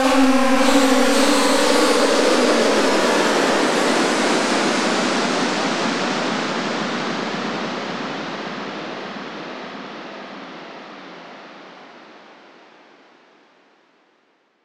falling apart, weird; Zero-G Ambiosis

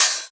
Open Hihats, Cymbals

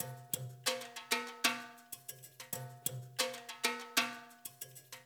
ABO BR TIMBA; Spectrasonics

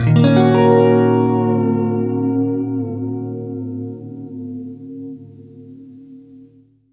FLESH CHORDS, Guitar

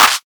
drum clap 707 on fire; Virtual Riot; Drum Hits

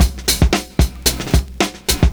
70s Breakbeats; USB Soundscan vol.46; AKAI

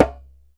DJEMBE, African Drum

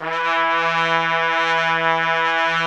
E3 TRUMPET; Northstar - New Gold VOL-1; ST.TRMPTS

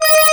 DG WAVE, DIGI SYN

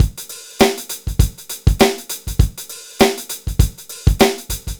dry loop, BPM100

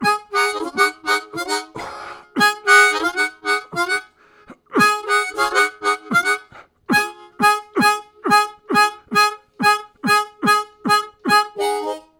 Vocalised Chords, Harmonica Riffs; Zero G

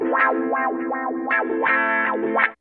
GUITAR; InVision Interactive; 40 Oz Phat Slammin Hip Hop

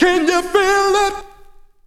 VOX SUNG; Megabass Remix! 2

